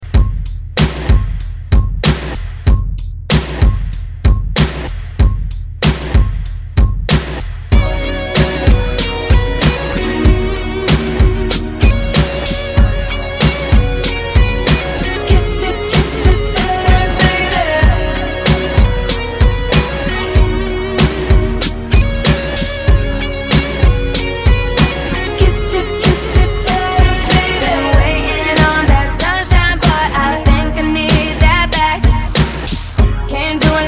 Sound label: Club Redrum